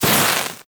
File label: Axe Projectile Impact G; Tenno; Sundial